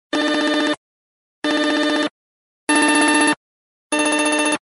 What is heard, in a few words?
Ringtone